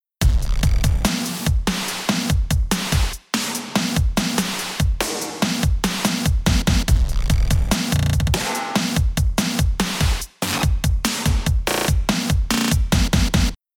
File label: Rhythm